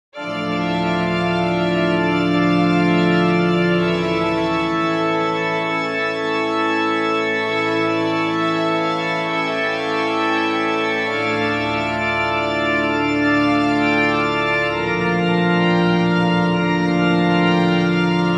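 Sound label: Vivaldi concerto A minor for violin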